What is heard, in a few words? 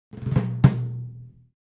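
Timpani Hands Short, mallets timpani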